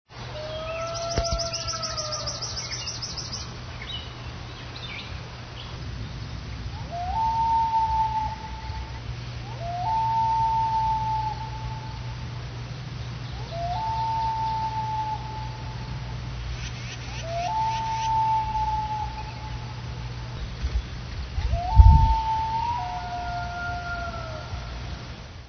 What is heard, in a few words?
Loon; fitch basin